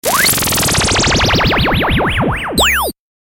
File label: Electronic Waves